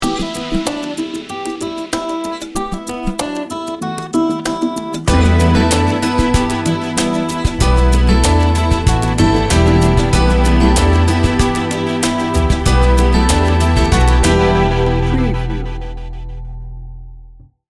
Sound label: trance lucent